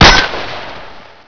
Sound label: carbine shoot